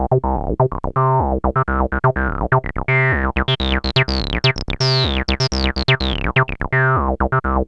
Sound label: TB 125, TB-303 LOOPS